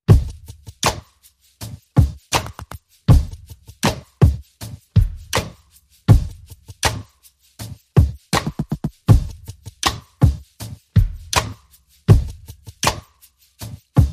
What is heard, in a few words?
Drums and Perc Only